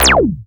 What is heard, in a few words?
FX Analog; Sound & Vision - Gigapack II